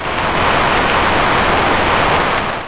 crowd

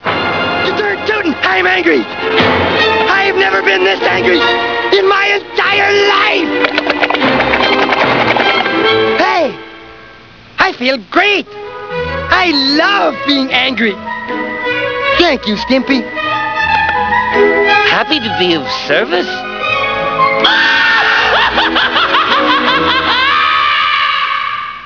ANGRY

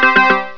EPIANO

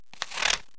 SNIP

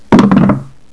KLACKLE

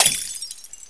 HI GLAS

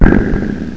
hit

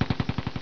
MGUN; LEDWARS

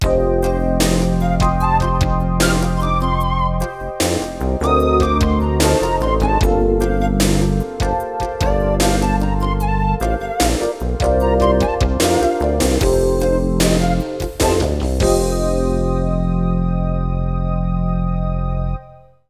JINGLE

